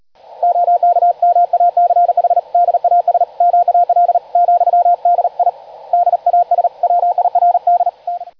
CW Hispeed; hamradio; digital communication modes; Digimodes

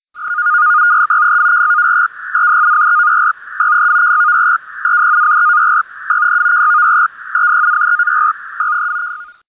Pactor connect; hamradio; digital communication modes; Digimodes

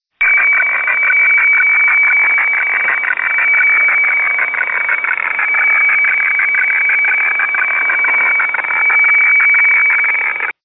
Hf fax, digital modes; hamradio; Digimodes

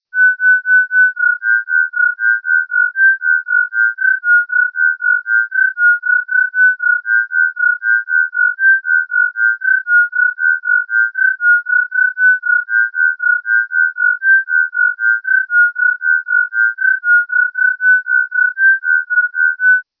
Throb 4; hamradio; digital communication modes; Digimodes

